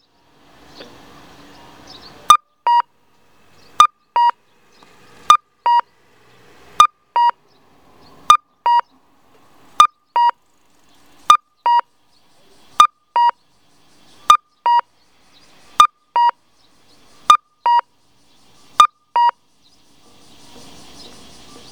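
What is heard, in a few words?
cuckoo, audible traffic signal, intersection; onkyo kosaten; aichi; anjo; anjoshiyakushomae; kakko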